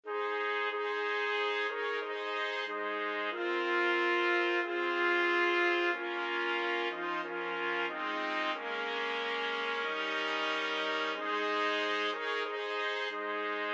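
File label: 4 trumpets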